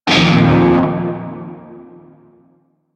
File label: Shawzin Blues Chord E; Music; Warframe; Narmer Shawzin